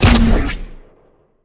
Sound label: Activate Rocket; multiplayer kart racing; boom karts; android